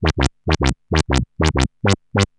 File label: Arp D Line, ARP Odyssey; musicradar-synth-samples